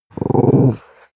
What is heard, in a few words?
dog small growl short; natur-tiere; hunde